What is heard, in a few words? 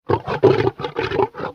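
Pets Triceratops Ecstatic; creatures; Lego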